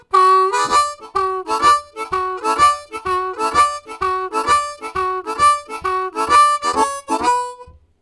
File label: Boogie Up, Harmonica, Riffs; Zero G